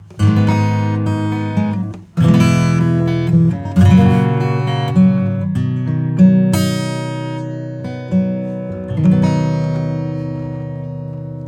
INPUT GAIN 6 THRESHOLD 6 HP FILTER 2000Hz

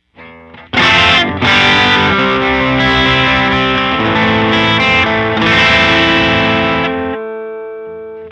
guitar bypass

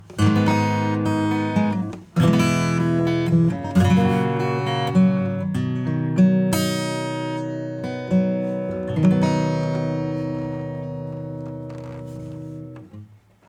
Acoustic Guitar, acoustic preset; SSL X Limit

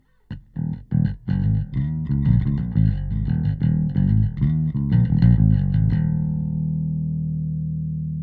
bass; Lindell 50 Series

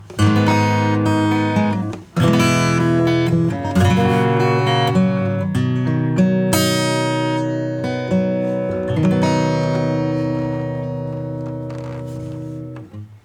acoustic; tranQuilizr G2

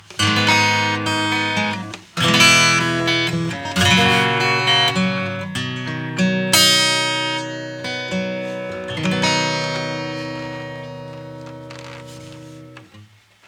Harmonics acoustic TUBE

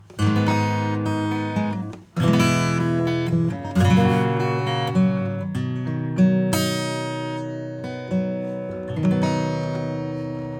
Acoustic bypass

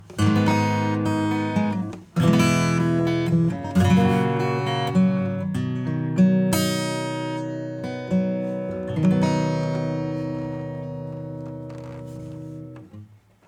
Bus Processor acoustic String Bus

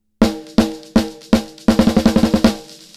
Delay TAPS 4 HIGHPASS 10.00kHz; Cluster

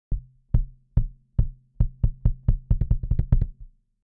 kick; SSL X Gate; preset Kick Long

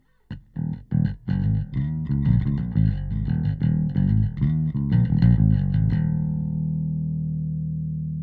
bass bypass; AMEK

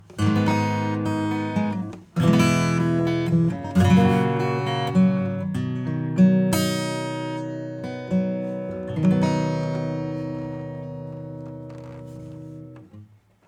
Bus Processor acoustic bypass

